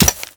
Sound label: Ghoul Expired Grenade Hit Dirt; Warframe; Grineer Ghouls